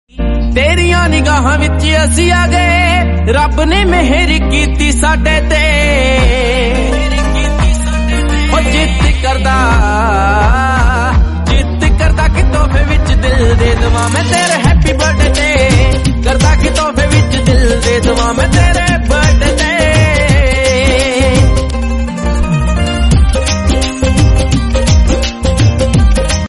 happy birthday song happy birthday happy birthday to you ji